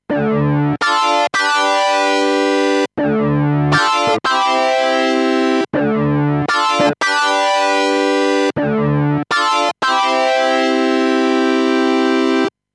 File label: P6 Sync